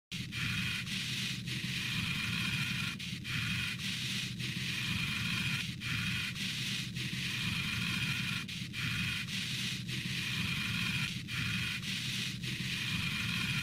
breathing heavily

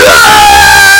man scream

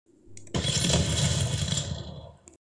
nitro fists uppercut; fortnite

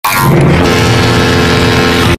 horn; spongebob

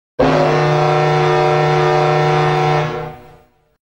foghorn sound effect; wimzies house

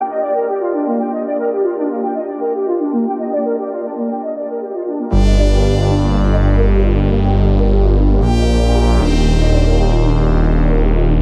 synthwave goose; blade runner 2049

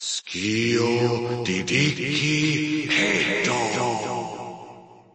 chant

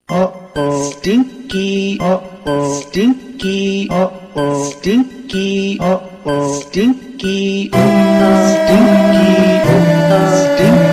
uh oh stinky song

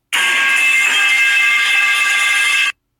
metal pipe

